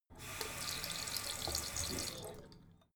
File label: tap flow light short, badezimmer